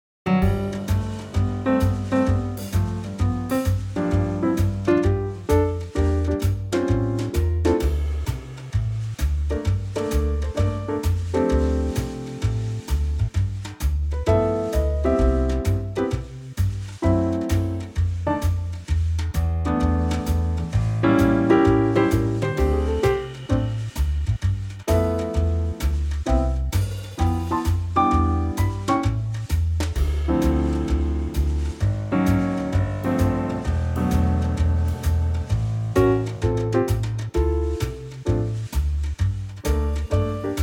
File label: C swing